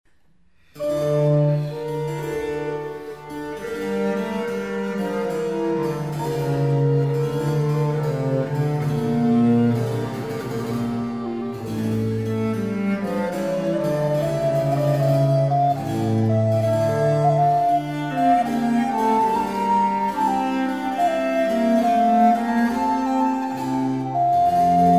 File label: Hotteterre Suite in e minor Sarabande, recorders; Bolton Bizey; Laurence Pottier